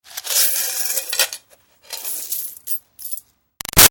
Foley Coins Dump Out Metal Bucket; Money; Big Room Sound

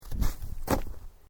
Footsteps Boots Snow Shallow Jump; Footstep Foley; Footsteps (Sound Effects:Human)

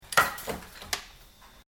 Industrial Machine Metal Punch Operation Single; Various industrial machines; Machinery

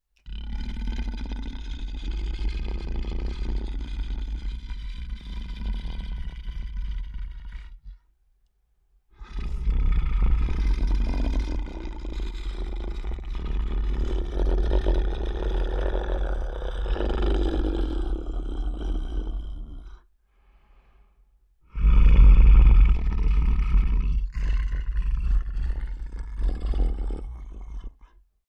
Creature Large Growls Low Grumble; Monsters; Horror; BigRoomSound

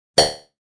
Pong; Pings; multimedia

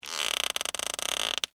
Squeak Creak Stress Slow; Foley; Squeaks; Objects

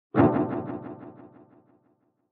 Impact Reverberate And Decay; Miscellaneous Impact Sound Effects; Impact / Explosion Sound Effect; Andrew Fleming

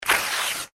paper rip; Sheet Of Paper Ripping / Tearing; foley; Objects; McKinney Sound

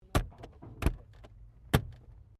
CAR MAZDA RX7 SERIES3 EXTERIOR GLOVE BOX CLOSE; 1983; Cars (Sound Effects:Vehicles)

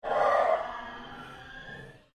Ambient Pulse Ghost Chatter; Sound effects created by manipulating other sounds; Scary Soundscape; Scary Soundscapes

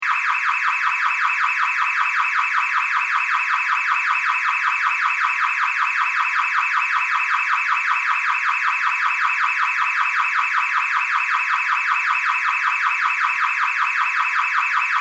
Auto Car Alarm Beeps Automobile; Vehicle; Cars; Vehicles

